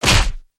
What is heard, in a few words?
human hit